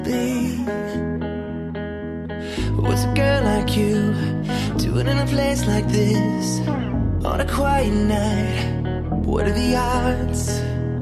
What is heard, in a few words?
ringtone